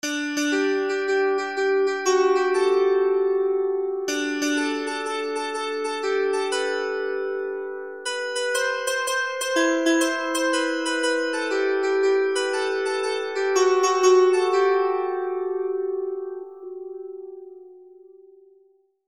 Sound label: zither